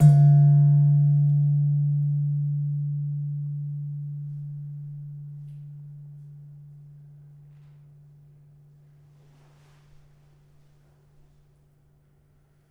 Slentem hard, gamelan

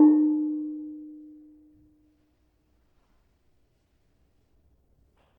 Barung 4 D sharp, gravity harps tuned

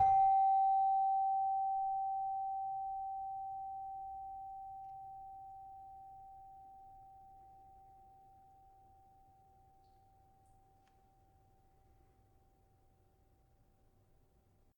Saron 5 G, gravity harps tuned

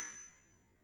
Panerus 7 B, gamelan tuned

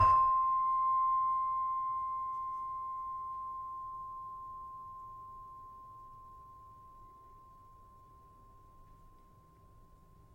Saron 6 C, gravity harps tuned

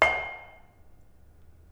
Bonang Panerus Sl 1 hard, gamelan; gravityharps; timbrebox

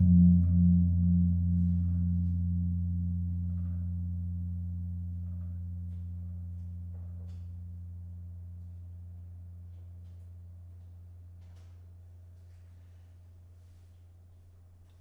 Kempul, gamelan; gravityharps; timbrebox

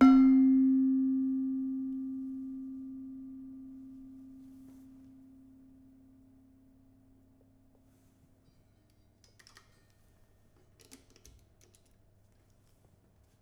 Demung, gamelan

